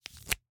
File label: Slap bracelet, smack, slap, whip, felt, plastic, unravel, slip; Eiravaein Works; Vaeyan IV